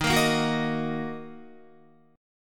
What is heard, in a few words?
Eb Minor Guitar; Ebm strummed; JGuitar